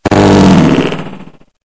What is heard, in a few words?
slowwwwww fart